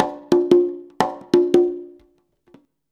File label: CONGA, Modern Percussion Loops; USB Soundscan vol.56 - Modern Percussion Loops; AKAI